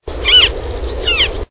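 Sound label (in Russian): lun bolotnyjj, marsh harrier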